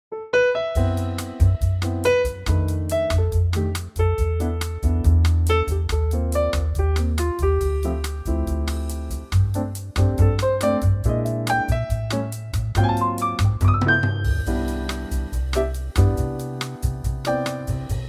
C latin

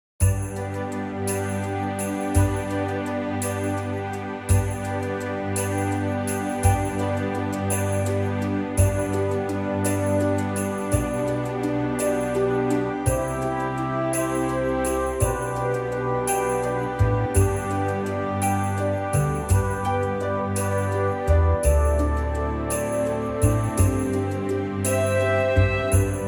G orchestra; key - G - vocal range - G to D (huge range!!); Exceptional orchestral arrangement of this wonderful festive gem.

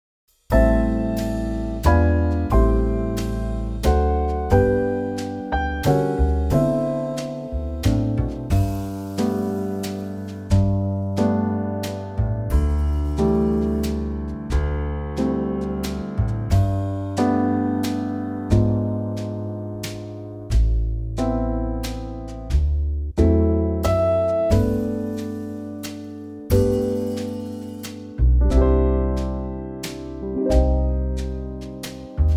G 90bpm; key - G - vocal range - C# to C

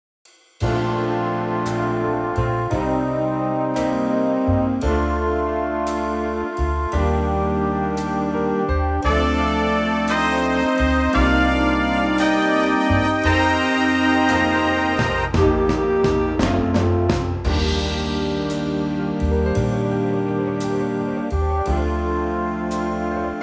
Big Band Bb B; vocal range - Eb to D#

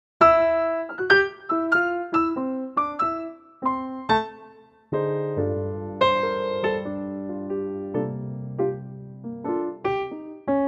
F Piano; key - F - vocal range - C to C

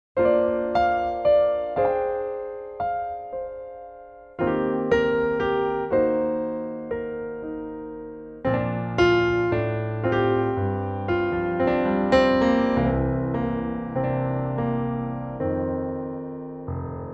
Bb piano; Pianotrak; key - Bb - vocal range - G to Bb (optional C); intimate 2024 Pianotrak

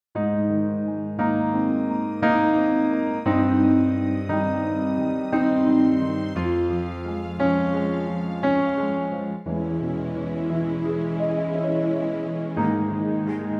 Ab orchestral; 9/8; key - Ab - vocal range - C to Db (optional Eb top note); Gorgeous orchestral arrangement of the wonderful big song from "Man From La Mancha

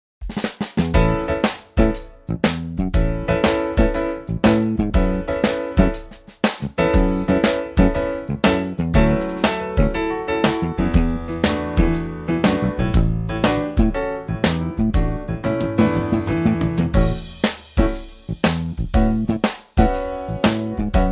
A swing; key - A - vocal range - F# to A

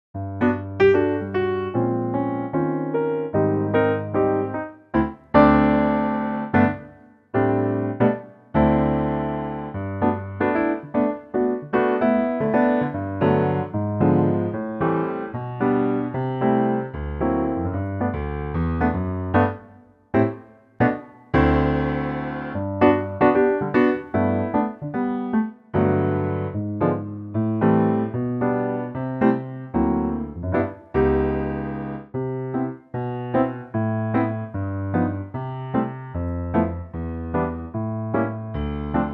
GC Piano; key - G & C vocal range - D to E

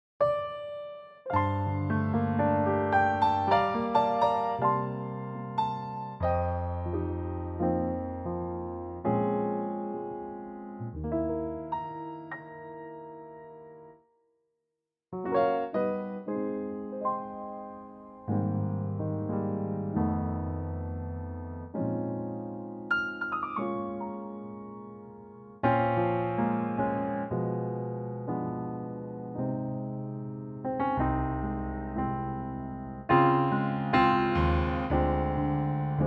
Ab Trio

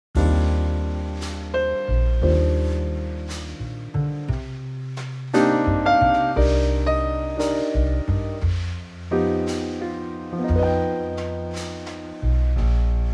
ballad

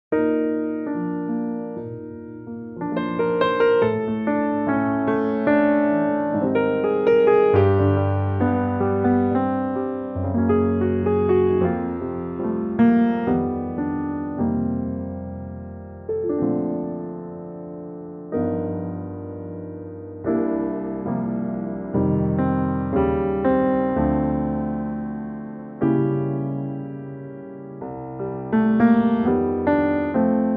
Bb piano

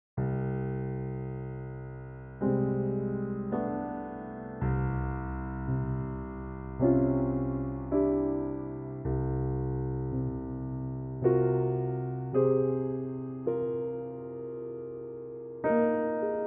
Bb piano; Pianotrak; key - Bb - vocal range - Eb to A

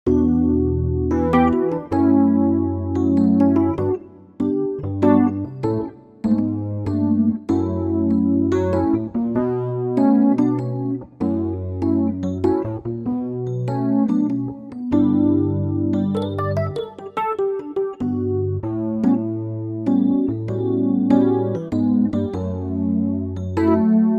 D Rhodes

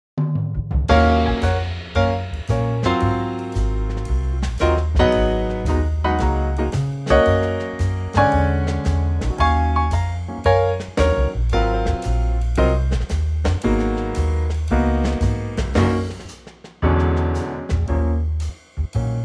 Gb trio

